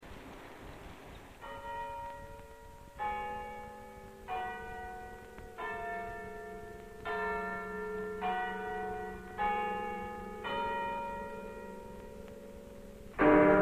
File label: motor; Lány a motorkerékpáron; Alain Delon